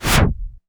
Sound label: cinematic impact wipe